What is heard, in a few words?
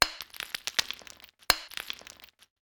hammer mine stone